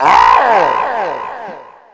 pain; quake2; Hueteotl

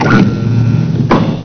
hatch; quake2; mnhcoop

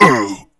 pain; quake2; coop; bfgladiator

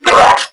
berserk pain; quake2; railz

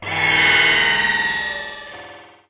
open; quake2; mnhcoop

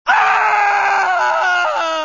ohohohh, cow; quake2; redux

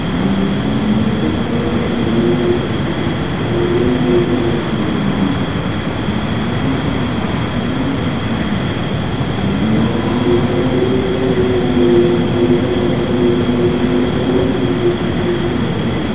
wind moan; quake2; coop

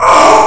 pain; quake2; coop

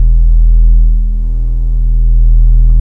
shock hum; quake2; mnhcoop; weapons

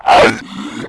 soldier pain; quake2; railz

